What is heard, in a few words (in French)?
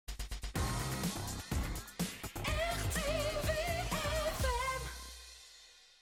Jingle Chanté; RTVFM; mac do